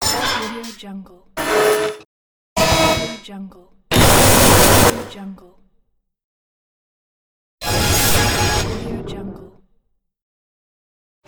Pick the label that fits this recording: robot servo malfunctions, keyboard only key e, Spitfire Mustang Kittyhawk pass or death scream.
robot servo malfunctions